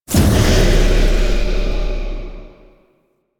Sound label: Void Portal Close; Warframe; Missions; Zariman; Zariman Assassinate